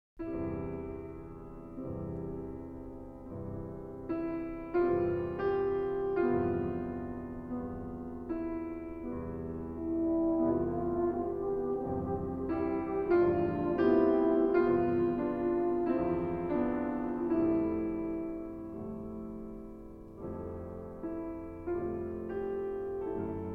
Concerto For Piano and Wind Instruments; II. Largo - Allegro - Tempo Primo, III. Allegro - Agitato

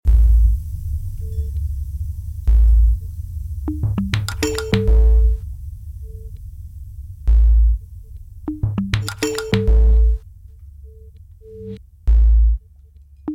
Drum Machines & Glockenspiel

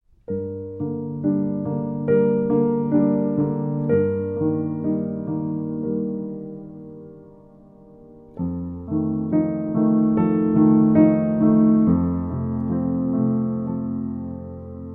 Adagio in G Minor

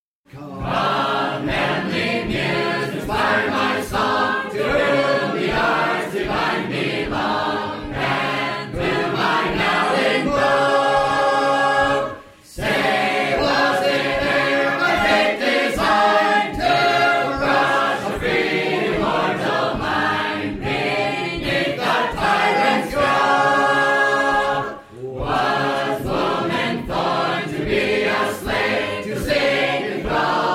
Sacred Harp Singers From Western Massachusetts

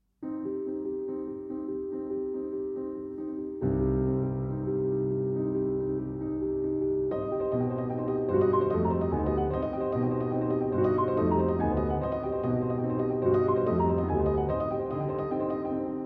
Piano Duet; Orphée; Act 1 - II. La Route

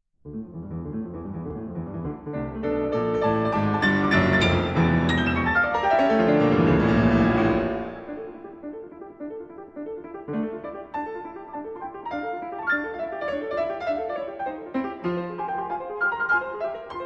Piano Sonata in D minor, Vivace

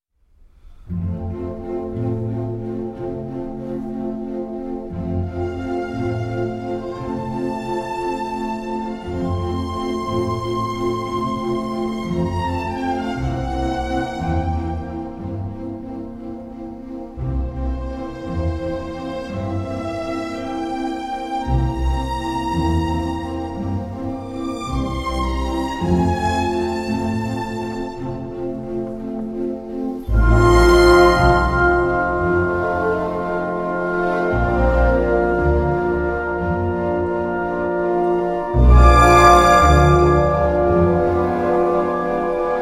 Piano Concerto

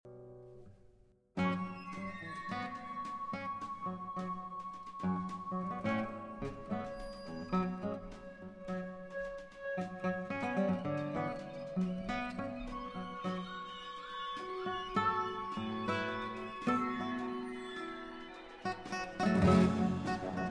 Concerto For Guitar and Orchestra

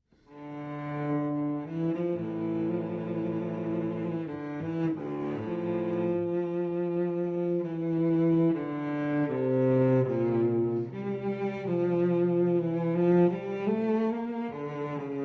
Cello Suite No. 2 in D Minor Sarabande; BWV 1008

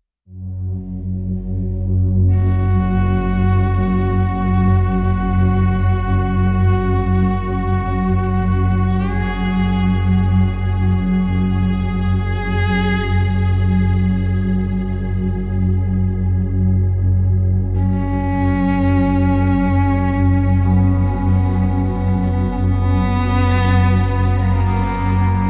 Violin Sky, Drone

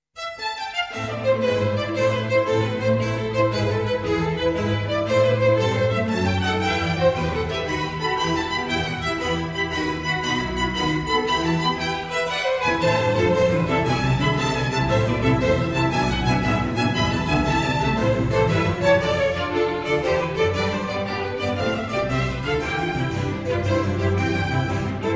Concerto in A Minor for Violin and Strings; Concerto No. 1

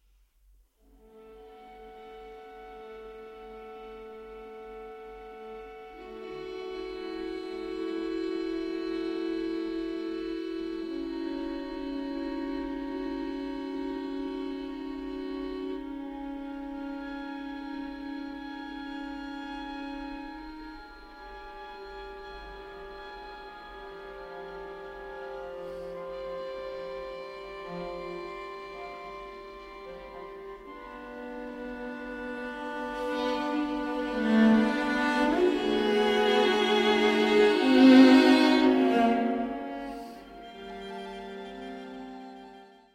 String Quartet; No. 3, Undirlýsi

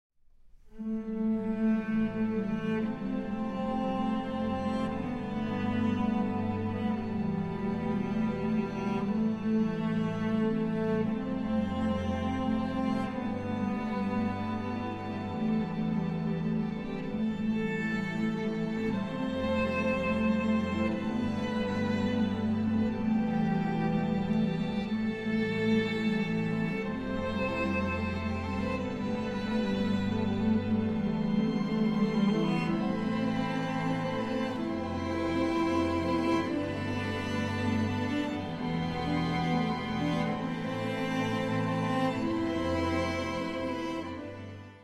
For Violin, Cello, Strings and Two Harps

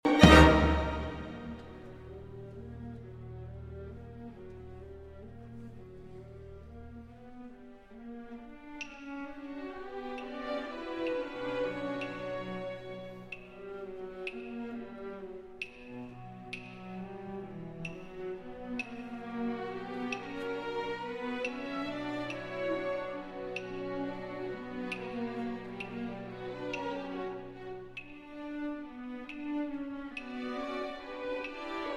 Alto Saxophone, Percussion and Orchestra; Double Concerto

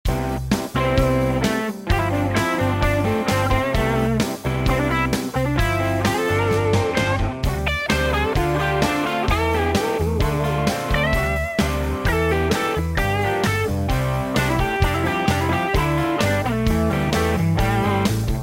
Blues - Lead Blues

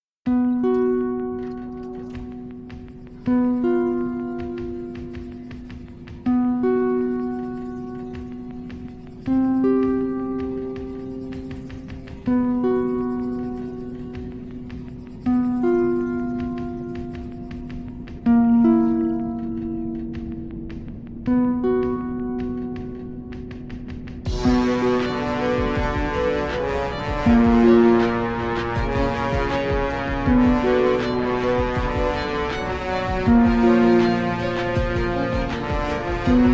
Steampunk Music

